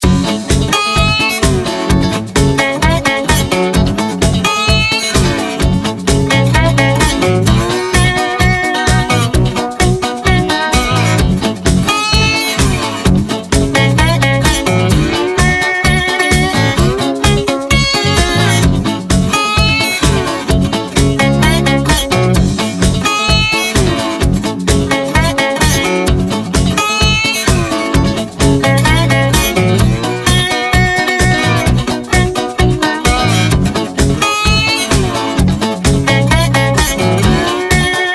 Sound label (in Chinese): 待机音乐; gamerip; Wild West Shootout